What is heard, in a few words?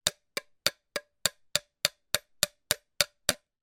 industrial hammering metal light weak thin